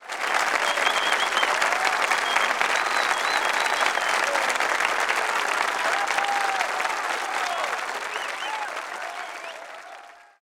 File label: Crowd Applause; ps2 windows; fightbox